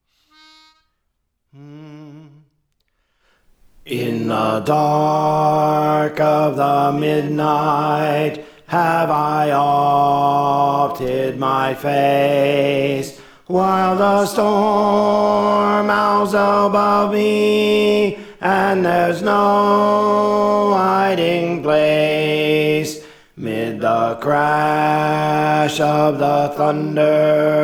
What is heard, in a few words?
Alto; Praise For The Lord Training